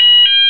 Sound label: fire alternating, fire alarm; garrysmod